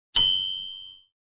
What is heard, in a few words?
mic Ding; mom mania